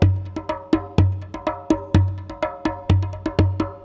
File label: PERC LOOP ETHNO 125; eJay